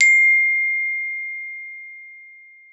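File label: GLOF C4, glockenspiel; Xsample VOL-4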